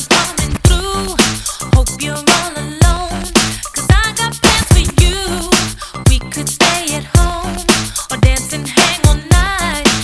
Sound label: pop